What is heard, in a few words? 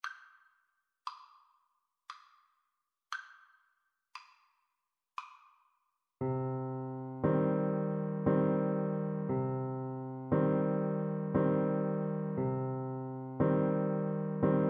bayan ko, Trombone